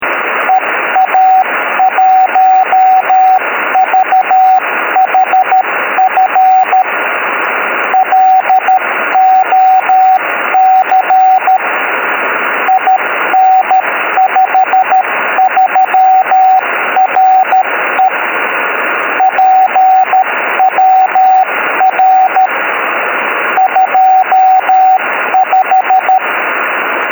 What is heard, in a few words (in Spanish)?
Baliza instalada en la Coruña, llegando magníficamente; EA1VHF/B; IN53RE